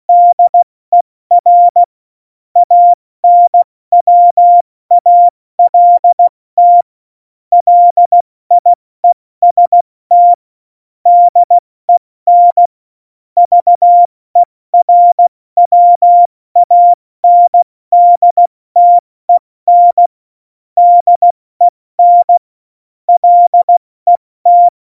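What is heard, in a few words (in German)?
Witz, cw morsen; Morsekurs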